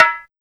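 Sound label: 150 UK timbale, Ragga & Dub Reggae; USB Soundscan vol.27; AKAI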